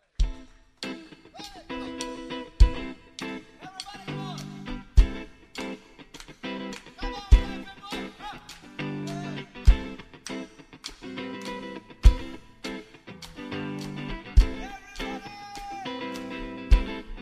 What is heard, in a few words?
funk machine live